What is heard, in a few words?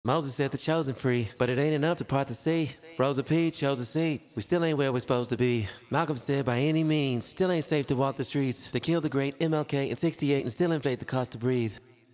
acapella; acapella example before ovox vocal bender